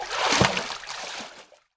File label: Underbelly Angler Attack